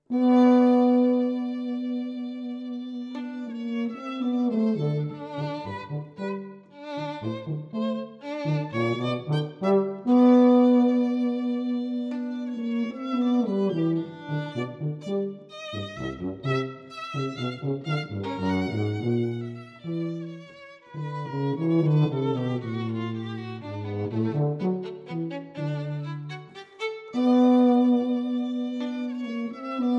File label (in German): Unterkagner Ländler für Violine und Tuba, Andante grazioso